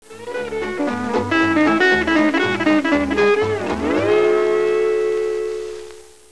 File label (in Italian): musica strumentale